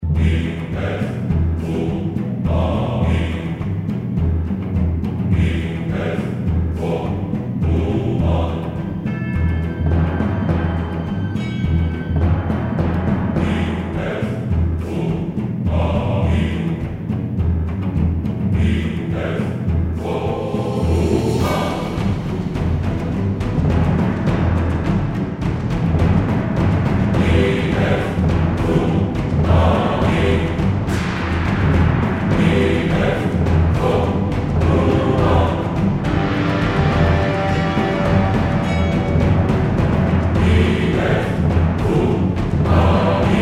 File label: title loop; dungeon hunter 2